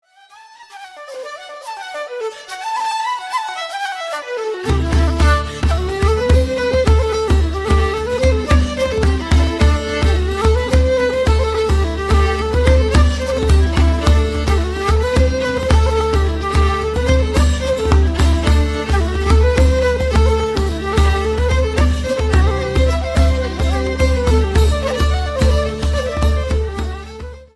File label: Reels